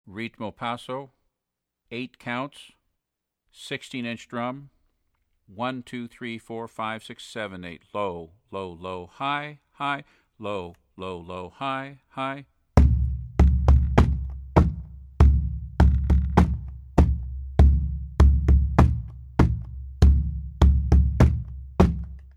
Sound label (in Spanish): Ritmo passo